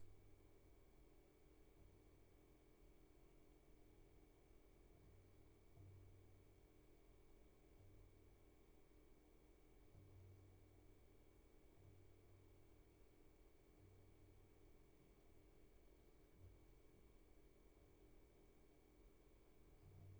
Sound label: roomtone; Lever